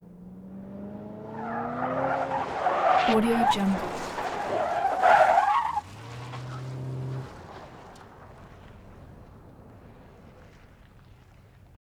chevy impala car approach in fast swerve; 1982

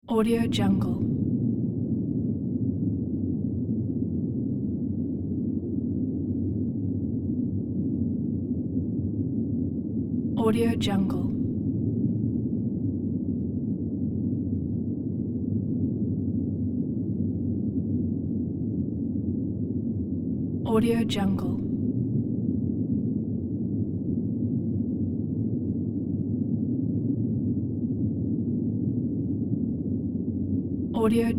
underground rumble

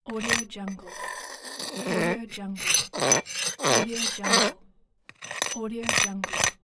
metal tools; spillaudio

